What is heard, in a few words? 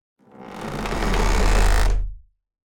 Designed cinematic wood textures forced bending stress